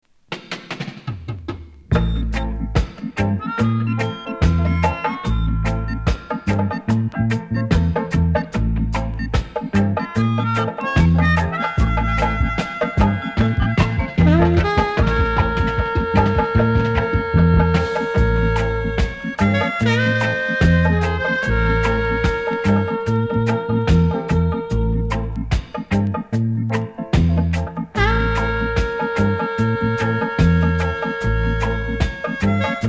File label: drums of the arab